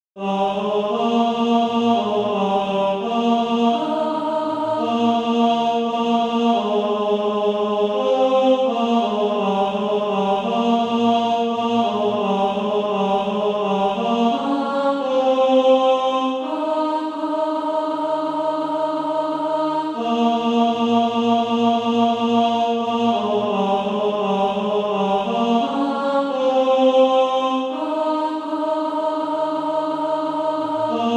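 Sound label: ten, Practise Music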